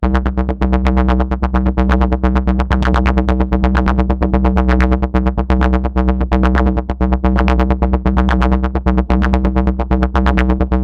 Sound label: digiridoo, instrument